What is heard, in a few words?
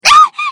death; csgo